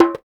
PRC, Bongos; Spectrasonics; Supreme Beats; World Dance

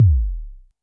korg trident

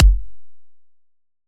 kick layer

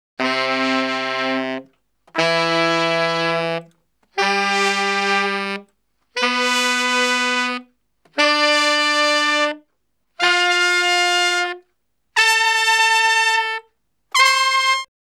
Salsa Picante; Masterbits; World Zone VOL-3

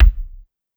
Kick, Clavia DDrum AT, Pitched Down

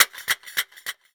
Mizmah, Percussion; Sonic Foundry (Sony Creative Software); World Pop

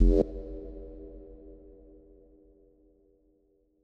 Rev Bass F1; Grime; 135bpm; Zero-G Sound Sense Grime Scene; Construction Kits; A Positive Vibe

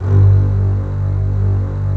ARCO STRINGS; OMI - Universe of Sounds

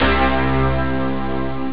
dance piano; ST-XX Amiga Soundtracker Sample Packs

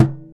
Surdo Soft, Percussion; Roland BK-5

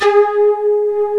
LINEAR SYNTH

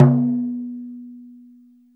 BODRAN; AKAI S-Series CD-ROM Sound Library VOL-2

